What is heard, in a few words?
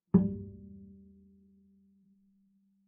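Bass pizzicato fortissimo sul A G2, Double Bass; MIS Pitches - 2014; Strings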